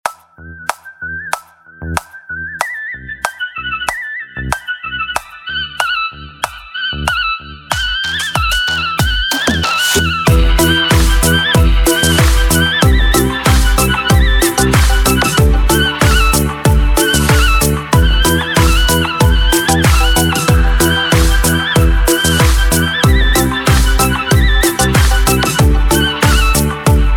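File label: zvuki flejty, flute sounds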